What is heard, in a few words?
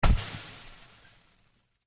Poof Sound